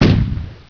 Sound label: Penetrator firing; Bedlam 2 1.1.1; Bedlam Sounds